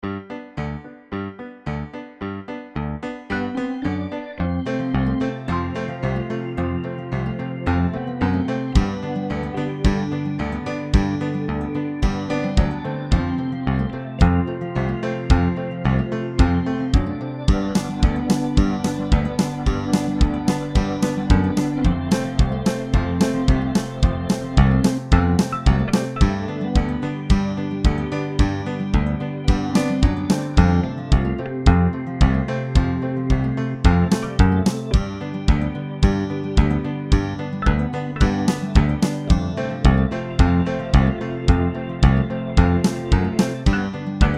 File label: oompa